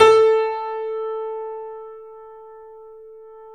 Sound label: A, 3-D Audio Collection, 3D Pianos, Yamaha C7